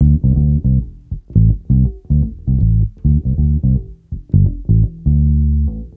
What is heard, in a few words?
Bass Loop, Ambient Grooves; Sonic Foundry (Sony Creative Software)